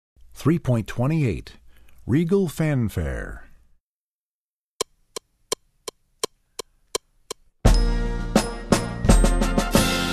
Fanfare, Percussion; Percussion Book 1

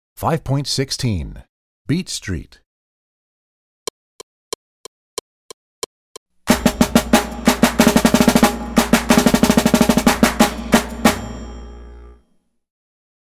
Beat Street, Percussion

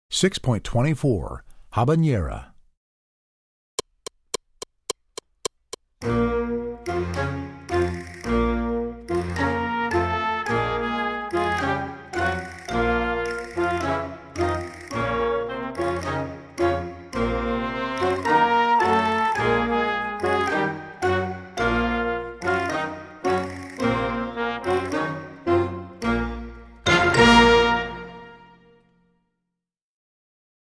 Habanera Duet

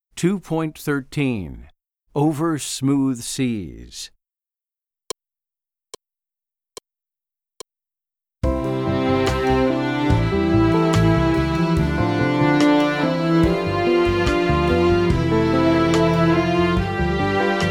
Over Smooth Seas

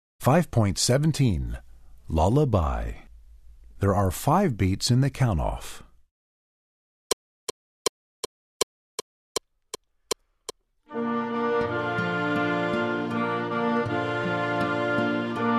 Lullaby Duet

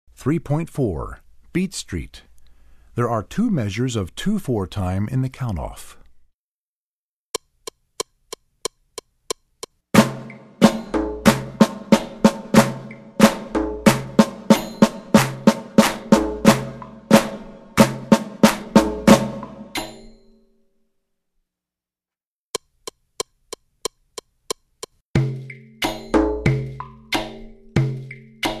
Beat Street, Percussion; Percussion Book 1

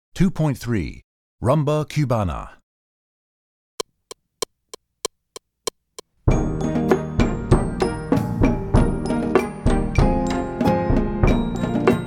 Rumba, Percussion; Percussion Book 2

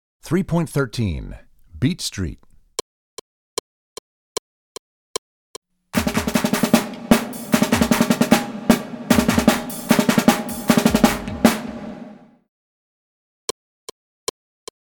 Beat Street, Percussion; Percussion Book 2

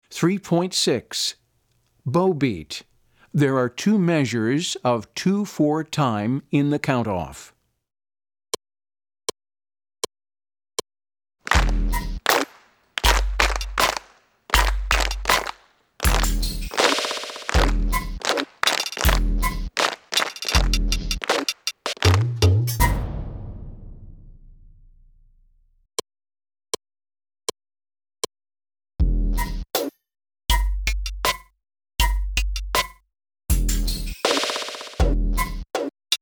Bow Beat

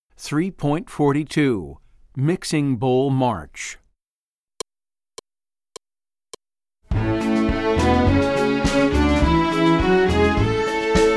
March

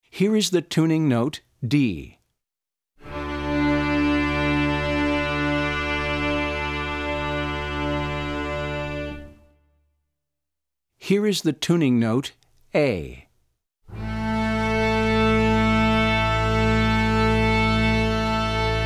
Tuning Notes; Play-Along Tracks for Opus 1

